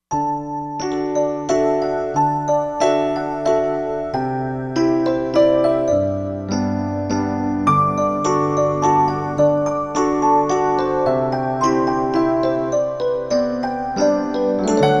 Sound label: Piano